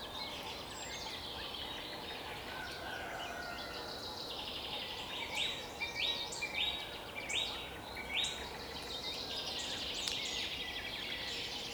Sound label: Cygnus Olor